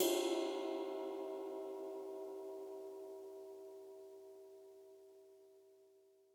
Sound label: Ride